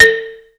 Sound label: ZYLIMBA; Greytsounds VOL-1